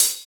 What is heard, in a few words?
Open Hhat, HiHat; Equipped Music; Loungin House